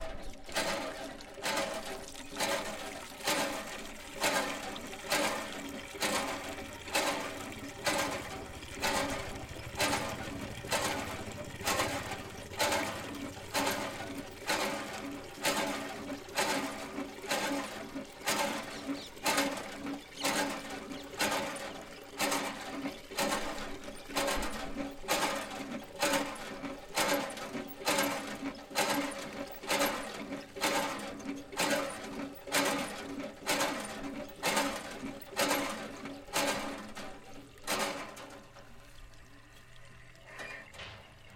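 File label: Indian hand pump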